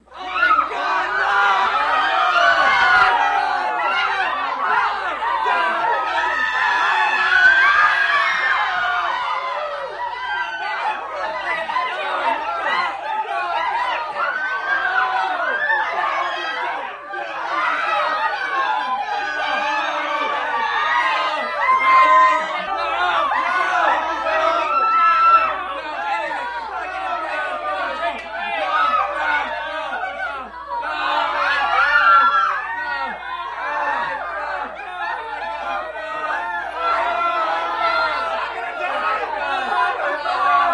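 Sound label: Crowd Smaller Frightened, riot panic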